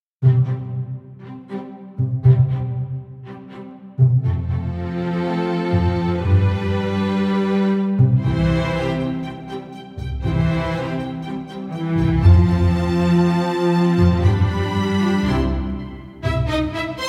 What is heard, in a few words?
Strings Only